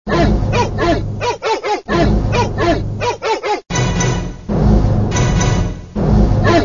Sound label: sampler, electric